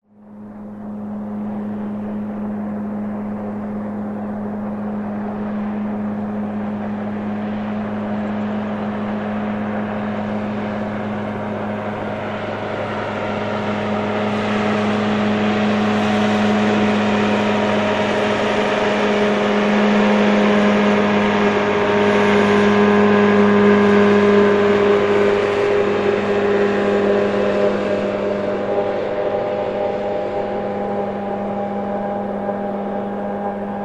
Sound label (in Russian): motornaya lodka proplivaet mimo